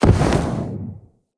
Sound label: Enter Aim Glide, Foley; Tenno